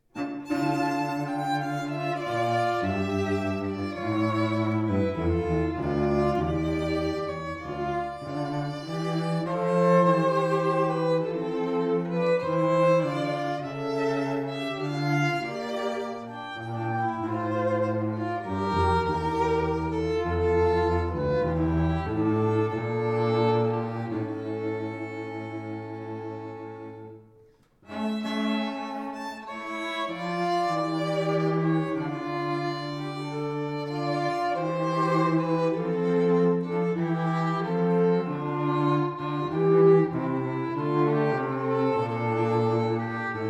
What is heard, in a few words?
trio; pezel